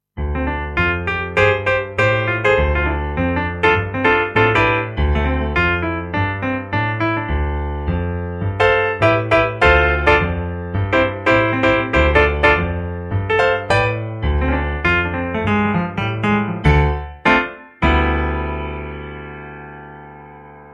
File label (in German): Grand Piano 2 mit ParaEQ